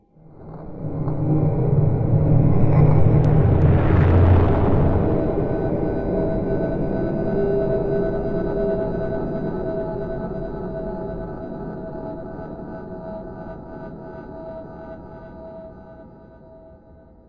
Magic Ambience Spells Menacing; David Dumais Audio; Spells Magic 1

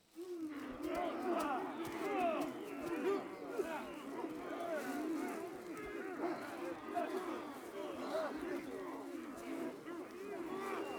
EXT GROUP Battle Steady Fighting, Battle Crowd; Coll Anderson

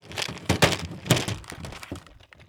foley; 99Sounds; Sound Design Tools; Raw Foley

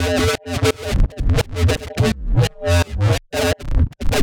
Loop - 170 bpm, Glitch Noise; 99Sounds; Mangling Audio

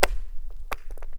ice bang; Sound Design Tools; Raw Foley

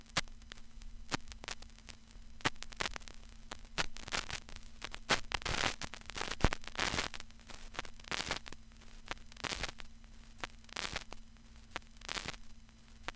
vinyl crackle; 99Sounds; Vinyl Noise SFX

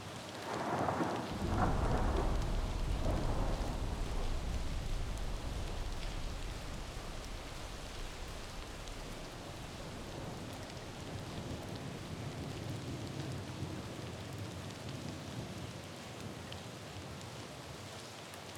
Rain, City, Light, Thunder, Peel; EMPTY CITY RAIN

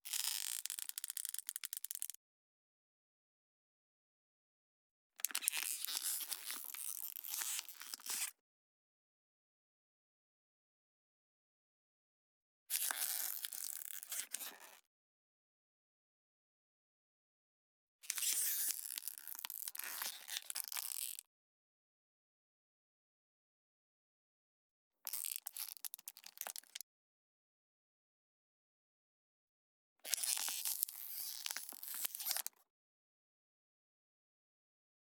SQUEAKS AND CREAKS Water Bottle Squeaks Long; Squeaks and Creaks v2

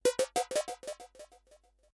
space trash; Kenney's Sound Pack; Digital Audio

